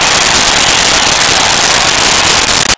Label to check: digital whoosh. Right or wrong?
Wrong